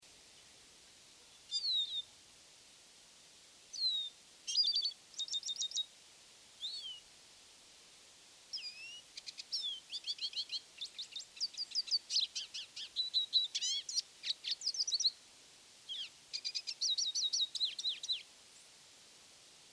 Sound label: Lesser Goldfinch